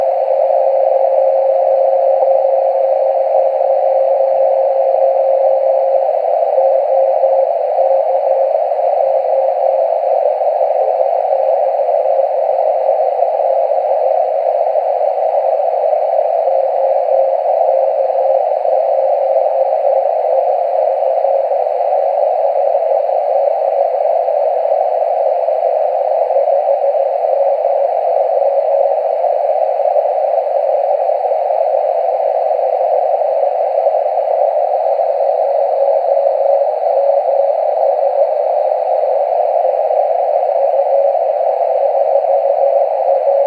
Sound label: 160m beacon; Jak je slyšet 1mW z MIB na vzdálenost 8km při šíření povrchovou vlnou?; Liska; Nahráno obyčejným telefonem; OK2WA; FT950